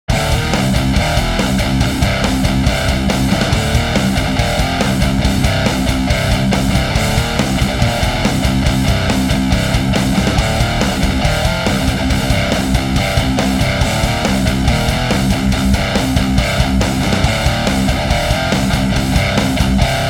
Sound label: METAL, Hans Metal Pack; Fortin; Axiom